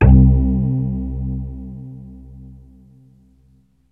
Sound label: BASS SWEEP, MORPHEUS; Syntec; Wall of Sounds